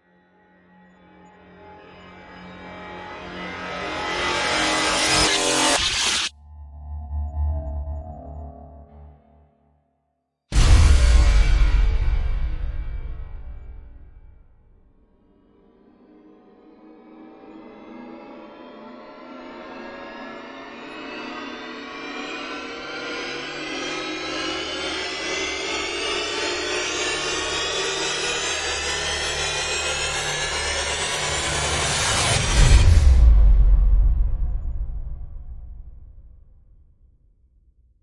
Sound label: Risers Metaphysical Engine; Gothic Storm Music; Advanced Sound Design 2012